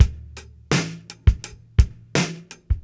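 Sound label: 84 bpm, beat loops; Zero-G; Monster Beats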